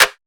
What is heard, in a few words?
clap smile; Drum One Shots; SD MIDNIGHT Sample Pack; Slate